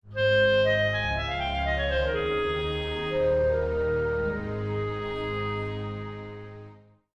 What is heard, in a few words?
Clarinet Concerto, Sting